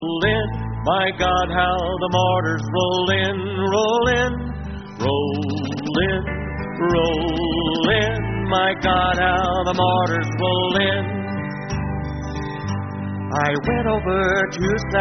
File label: Lullabye